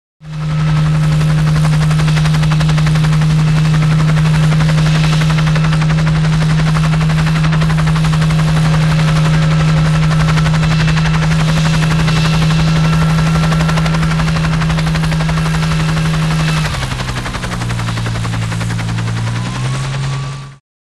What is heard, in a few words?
Helicopter Idle Sioux